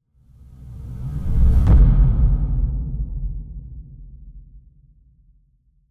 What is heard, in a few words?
Deep Impact